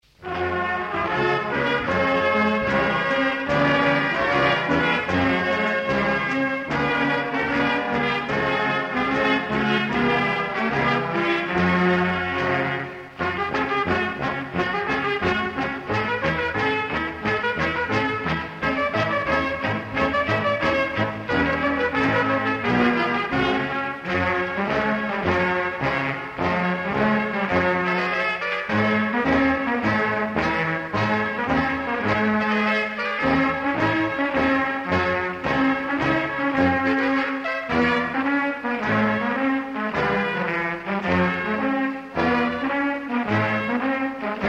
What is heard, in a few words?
instrumental